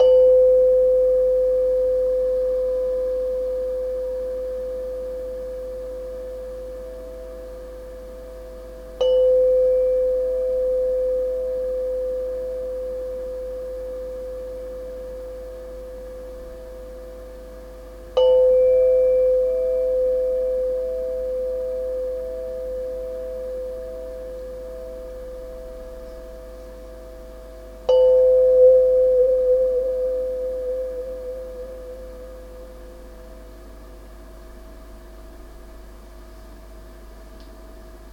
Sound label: wahwah pipe